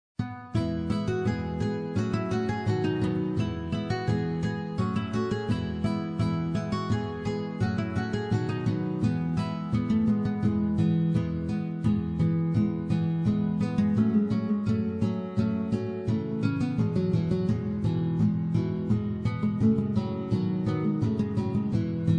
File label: Guitar Trio